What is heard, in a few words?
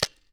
from side, guns; vm-68